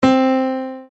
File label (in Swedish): skala entons